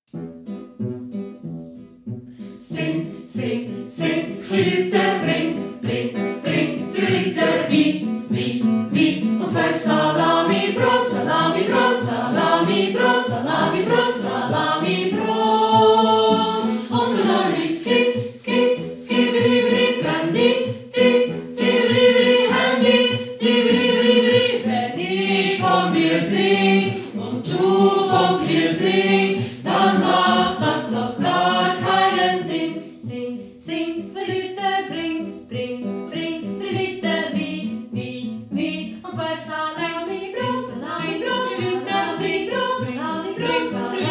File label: audio tutti; wahn sing